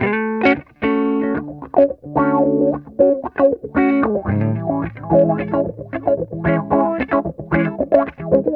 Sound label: MELLO, Funk Guitar; 90 s Sample CDs; Zero G